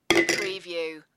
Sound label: Bowl Spoon Drop